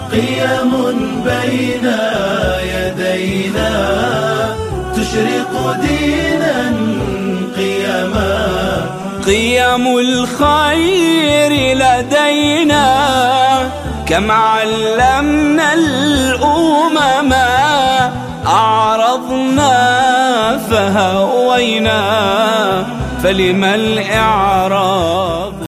islamic

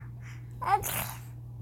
Bleh

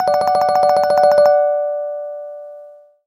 BBM Phone Open

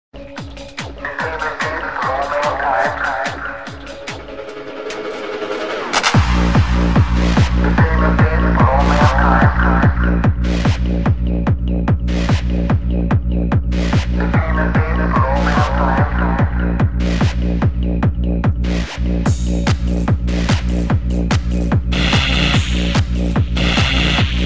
Hard Trance

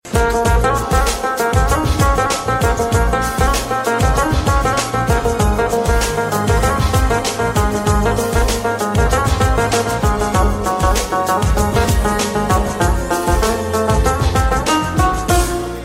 Instrumental

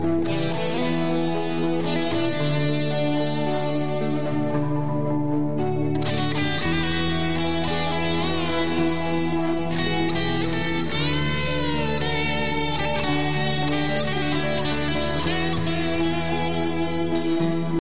Lead; meloboom

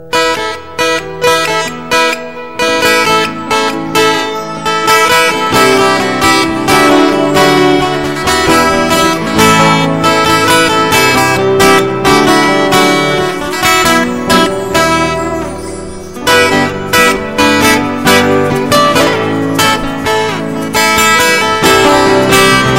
Folk Guitar

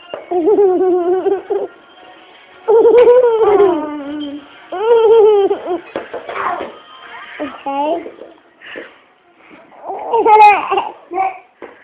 Baby Bongo Laugh

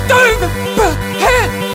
Frantic Noises

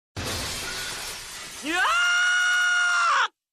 Screaming; K1-B0

